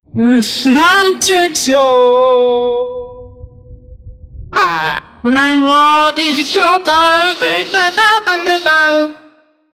wrong answer soung quiz

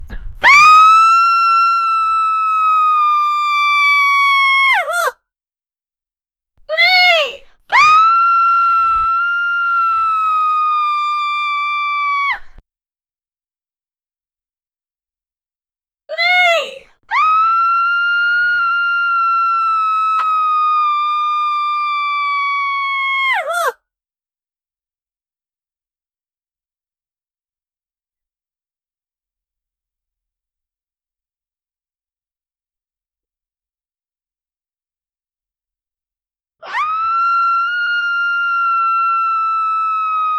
sound of epilator plus, beauty

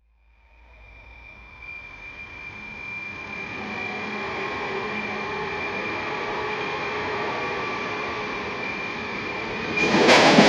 1970s uk train extended

